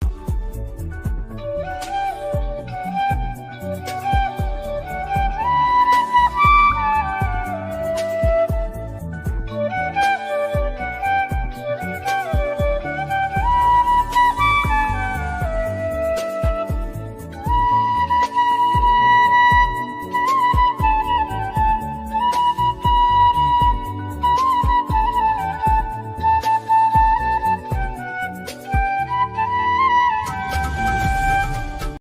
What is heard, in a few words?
flute ringtone